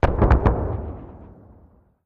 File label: 105mm Howitzer: Shell Explosion, Distant. Dual